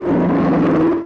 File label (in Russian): udar